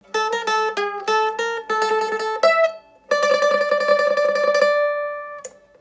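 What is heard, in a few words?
bulgarian advanced middle, mandolin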